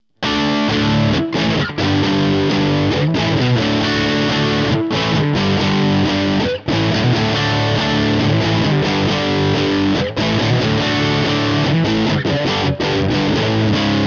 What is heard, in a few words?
amp jcm2000